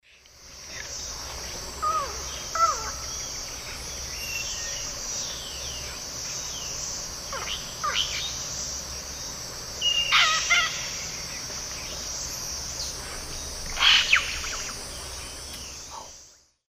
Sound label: Cacicus cela, calls; S. America; Eastern Lowlands